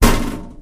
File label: metal computer impact soft; physics; Garry's Mod; hl2